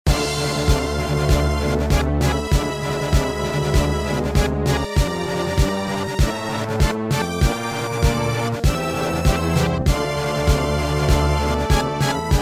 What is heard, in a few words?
Intro Music